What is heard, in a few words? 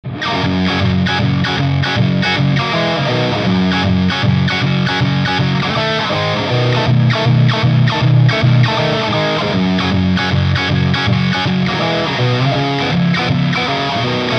Riff TS7 Hot, Ibanez Guitar Effects Pedals